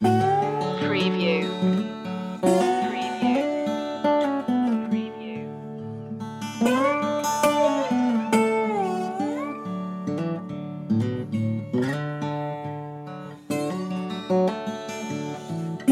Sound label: western loop